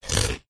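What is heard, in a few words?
cartoon eat crunch bite single low pitched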